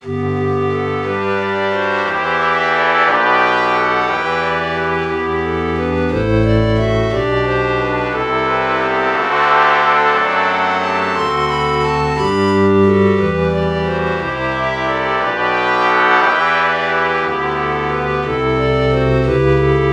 pan flute trumpet false bach morph

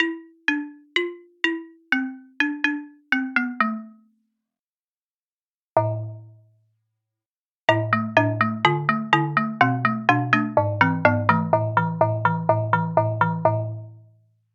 xylophone

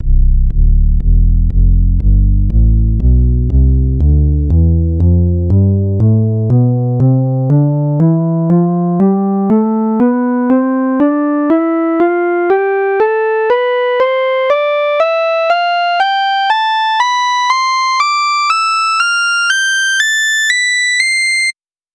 synth bass; tonleiter

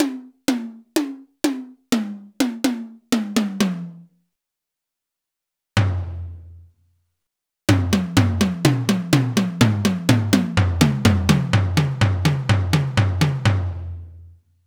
melodic tom

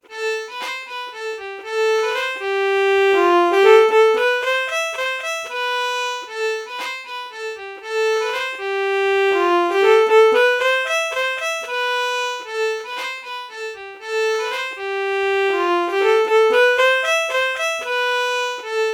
alto saxophone violin melody morph

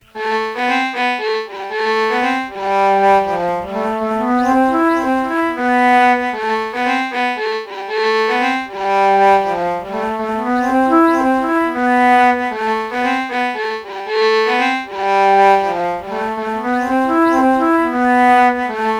alto flute violin melody morph low

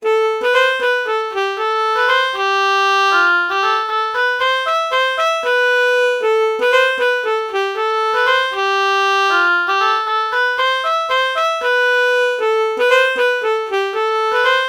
alto saxophone oboe false melody morph

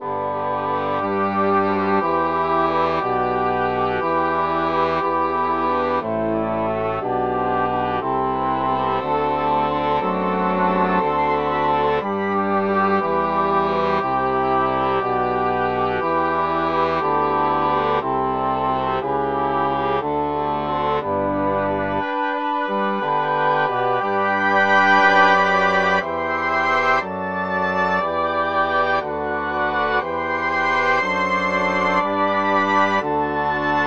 english horn

